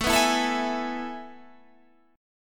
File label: A Major 7th Suspended 2nd, Guitar, Standard; 17,14,14,14,17,16; AM7sus2 strummed; capo=3